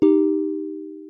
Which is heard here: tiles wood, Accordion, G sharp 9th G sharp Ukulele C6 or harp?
harp